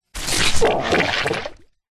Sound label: cartoon slime fart airy bubble wet; zapsplat